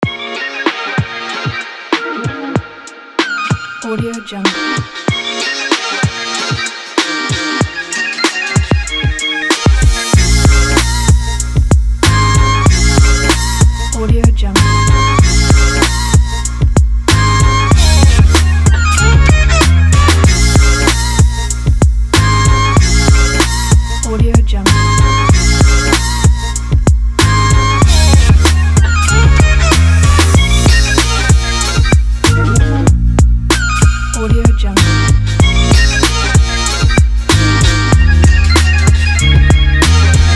hiphop in funk

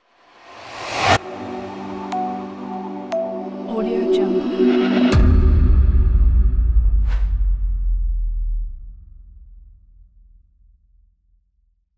logo mystic

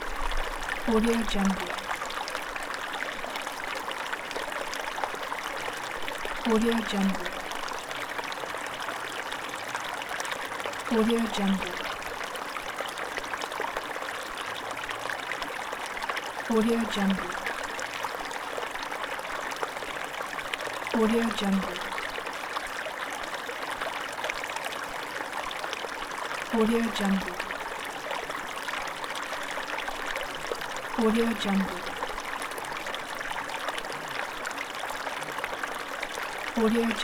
babbling brook; albasfx

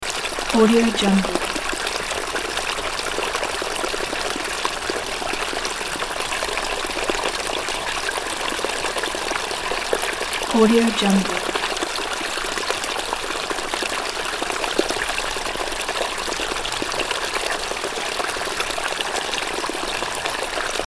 running stream

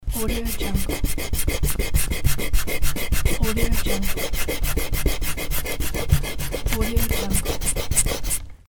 rubber eraser

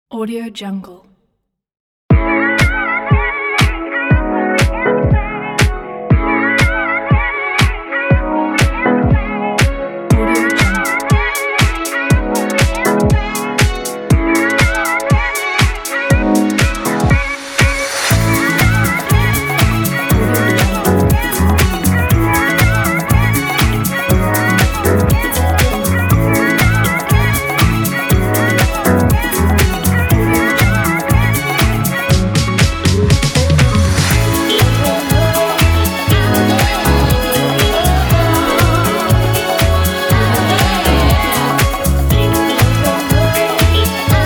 upbeat funky soul dance

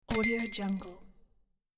coin collect

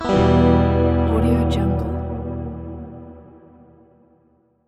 guitar tremolo

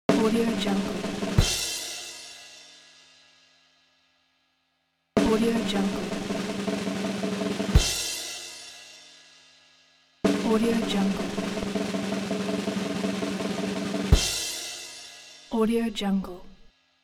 drum roll

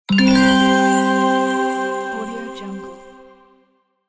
complete

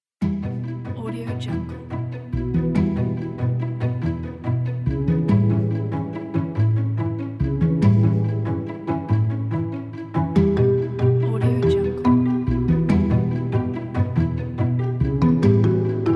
documentary

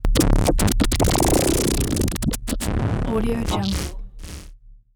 vocalizing liquid baby

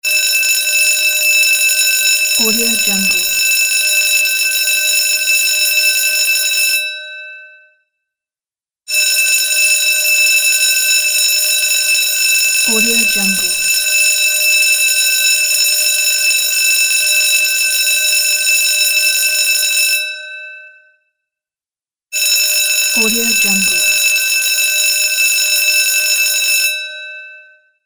school bell; garnavutka-nonexclusive

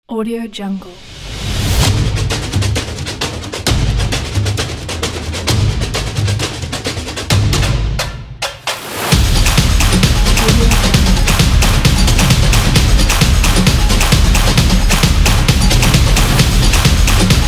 latin dance

